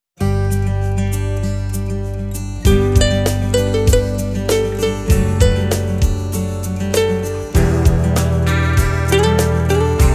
acoustic version

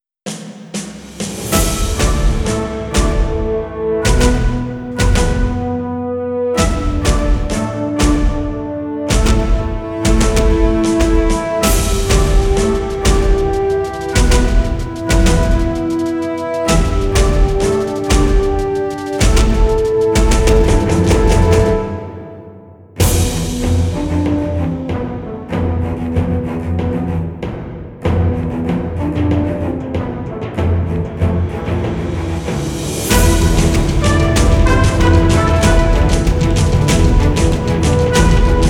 air forces

